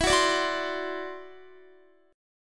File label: E 7th Suspended 2nd Sharp 5th E down Guitar 4F 4A 5C 5G 5C 6E; strummed; chord; JGuitar